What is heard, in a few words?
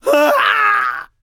Enemy Generic Human Death Eaten; Rampage